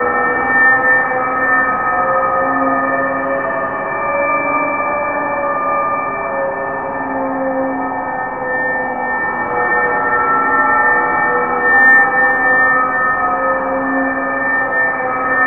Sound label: METAL DRONE; Zero-G Ambient 1; METAL DRONES